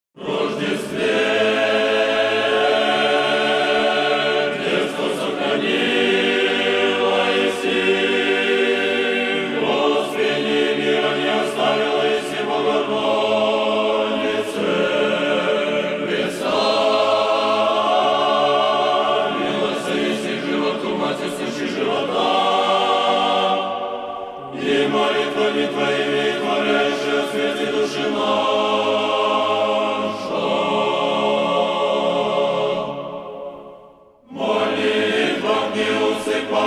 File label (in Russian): Тропарь и кондак Успения Богородицы. Мужской хор; Минской духовной семинарии